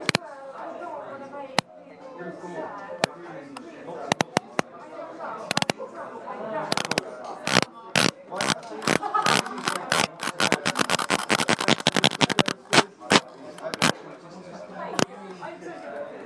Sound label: interminably loud scroll